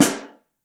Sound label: SN3 Mono, Snare